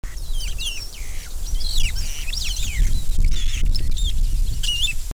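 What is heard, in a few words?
Pseudoleistes guirahuro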